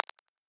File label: Click